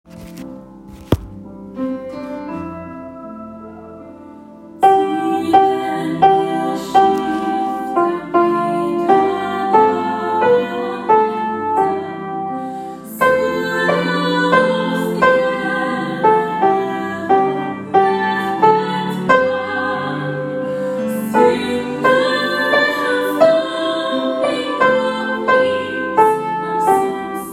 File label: tenor-bass; åfjord; stor er din trofasthet